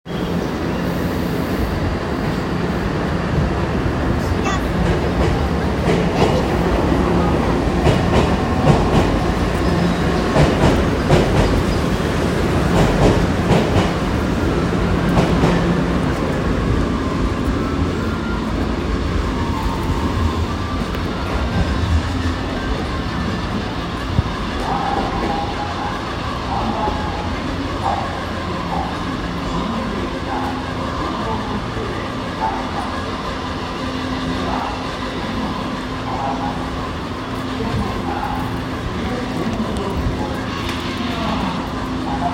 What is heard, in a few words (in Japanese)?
小田急線本厚木西接近 random guy sneezing loudly